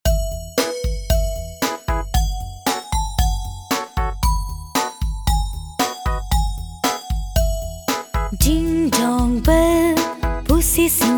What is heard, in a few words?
ding dong